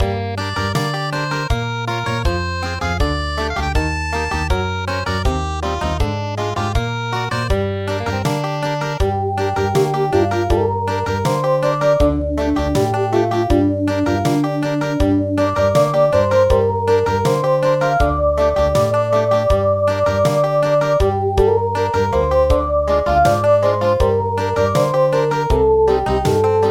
Country; Dagula

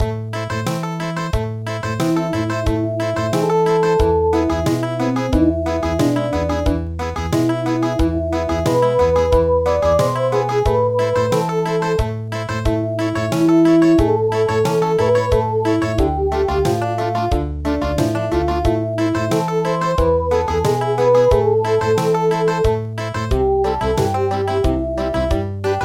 Country

